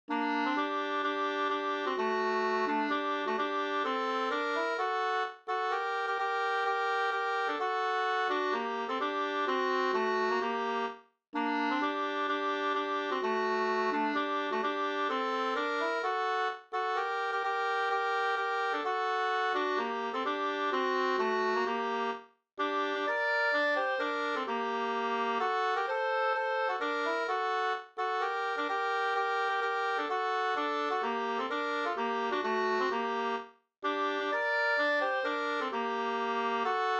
polska vispolska; fr Sd Rosenberg

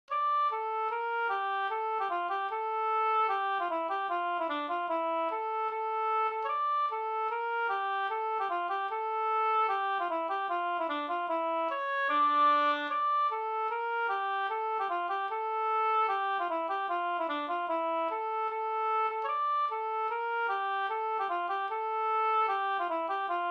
schottis Norsk schottis; e Myr-Hans Hs

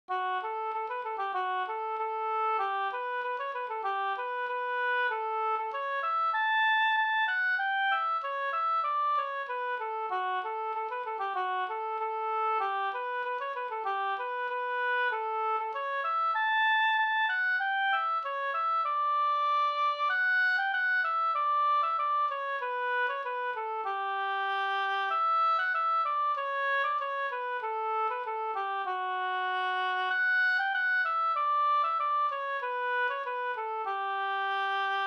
snoa

